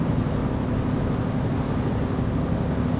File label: hum